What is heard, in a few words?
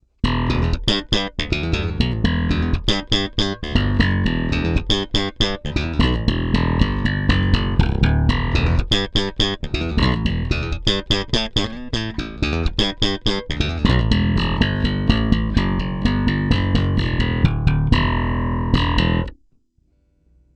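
Bridge active Slap, bass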